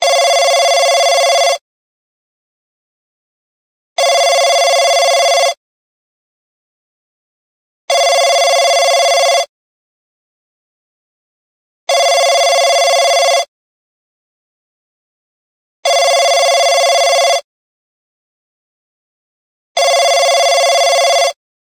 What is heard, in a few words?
Ring Digital; ZTE; Blade-Spark-Z971